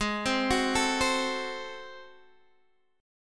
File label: Ab 7th Flat 9th down Guitar Open G